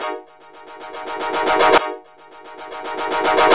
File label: Synth, Techno